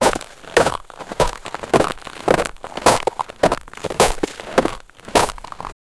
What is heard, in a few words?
heavy footsteps of an ice; sound effect; boss